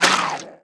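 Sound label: MON INSECT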